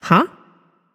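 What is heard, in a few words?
confused, female voice; smite; voicepack; hera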